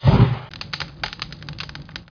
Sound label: Fire